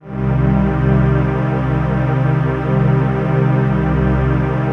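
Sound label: trance pad; Best Service; XX Large - Pads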